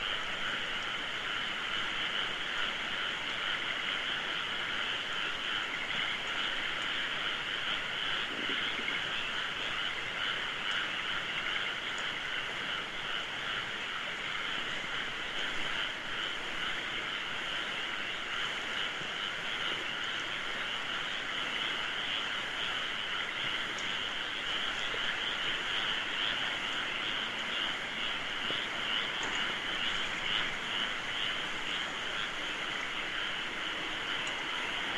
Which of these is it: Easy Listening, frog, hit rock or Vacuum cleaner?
frog